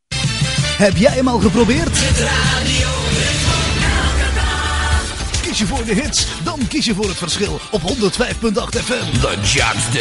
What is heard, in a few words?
jingle; Ritmo